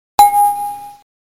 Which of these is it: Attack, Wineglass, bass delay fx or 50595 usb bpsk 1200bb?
Wineglass